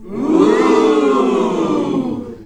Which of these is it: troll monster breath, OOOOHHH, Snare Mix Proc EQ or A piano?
OOOOHHH